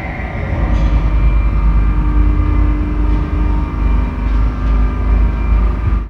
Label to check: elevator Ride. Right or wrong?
Right